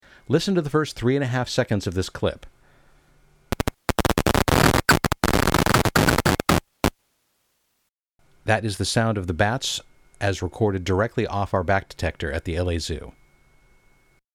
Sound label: bat detector LA zoo